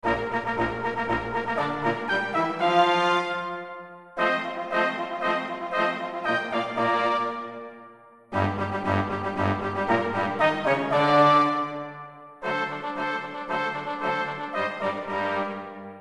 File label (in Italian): Inno italiano